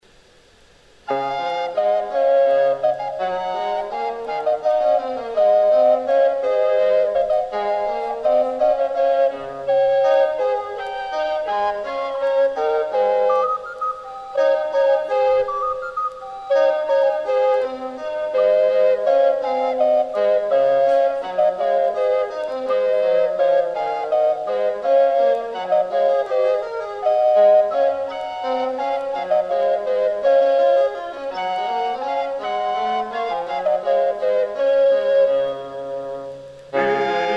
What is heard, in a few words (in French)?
chant dalliance